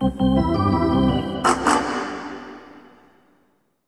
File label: Hockey Organ